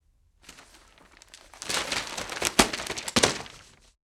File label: Magazines Drop Lots of Paper; Fairlight Sound Lib